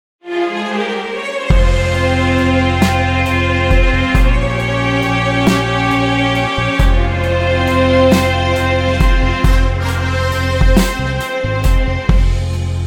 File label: For Male